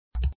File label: Crossbow